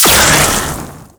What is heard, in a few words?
poison projectile